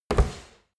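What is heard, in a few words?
step heavy stomping, footsteps; schritte; menschen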